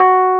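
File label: EP F#3 FF, electric piano; Greytsounds VOL-1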